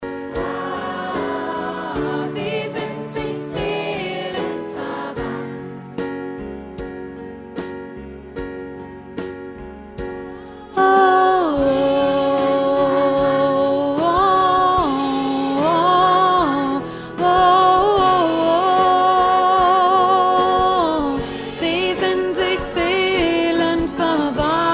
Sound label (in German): mittlere Stimme zum Proben